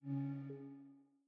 Tracker Failure; Peloton

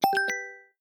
push; 2023 GPAY

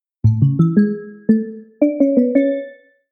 Logo Variant

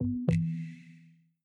Sleep; Peloton

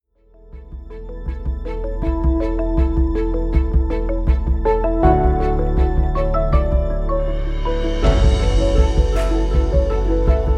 Anthem

